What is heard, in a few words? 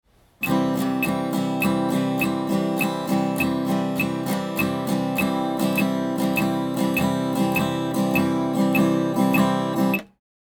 shuffle, guitar